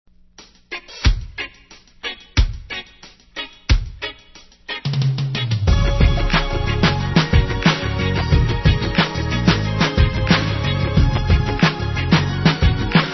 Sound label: instrumental